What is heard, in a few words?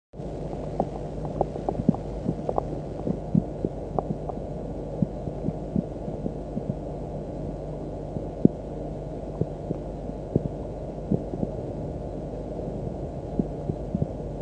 Bowel Sound Minimum Amp